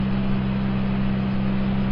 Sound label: Engine; Dr. Driving; Android